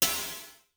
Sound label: Snare Crash; Vermona Mono15 Drums; blend with snare; Stereo layers